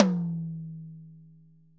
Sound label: ROTOTOMS; Sonic Reality